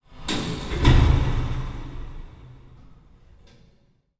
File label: industrial door heavy close thud reverb; zapsplat